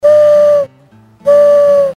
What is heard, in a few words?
owl